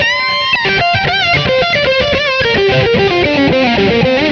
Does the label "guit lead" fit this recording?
Yes